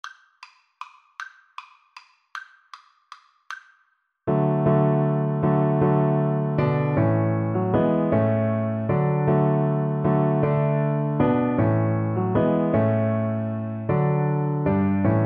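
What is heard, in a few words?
viola karaoke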